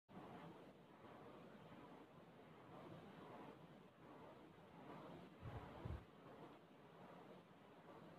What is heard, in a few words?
windmill; آسیاب بادی